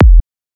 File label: kick; prism